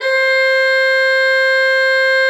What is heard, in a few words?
C5, Cathedral Organ; ILIO; Propeller Islands